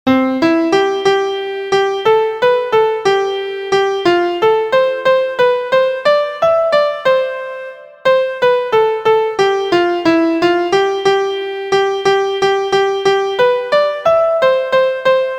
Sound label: MIDI